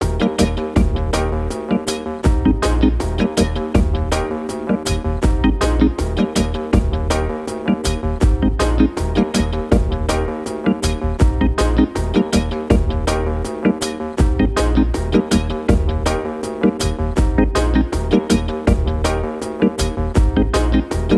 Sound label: skank